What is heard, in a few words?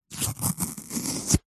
foley card toilet roll rip small section; zapsplat